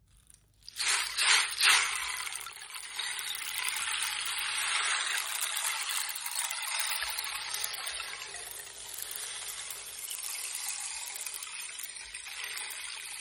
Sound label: Ocean drum audio; lotus mandala ocean drum